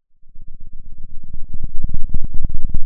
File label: Sound of a thunderstorm, center